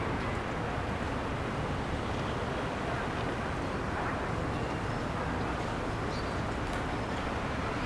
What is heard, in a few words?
ambi town; Mafie 1